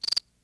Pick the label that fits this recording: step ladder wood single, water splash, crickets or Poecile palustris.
crickets